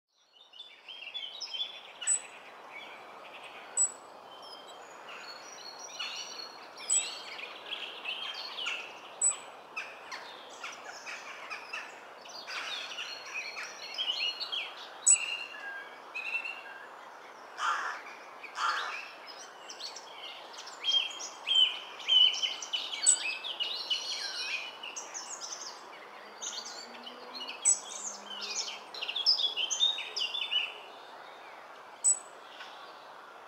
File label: robin birds chirping and singing beautiful bird song and nature sounds